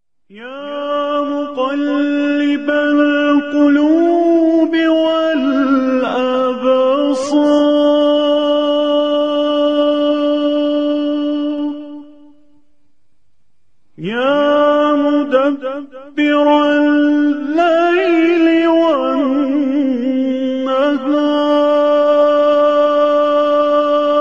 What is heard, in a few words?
noroz remix